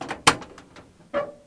truck open; gmod; tdmcars

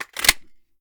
reload mag in

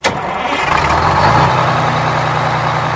apc start; vehicles

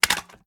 reload mag mag out, reloads; gmod

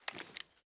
first raise up; reloads; gmod

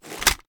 raise first end; gmod

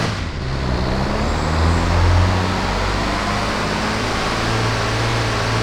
second, vehicles; cseries

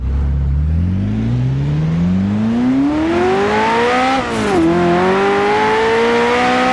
first, ferrari f40; vehicles

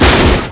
medium crash, vehicles

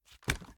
reload empty fast hand move; reloads; gmod

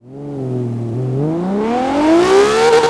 first, vehicles

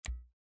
changeoption, pausemenu; gmod; paris

